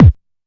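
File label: Plastic Bass Drum, Drumset; Roland SH-32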